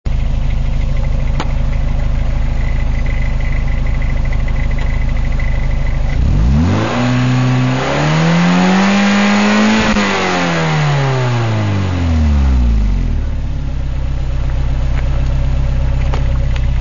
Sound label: trd exhaust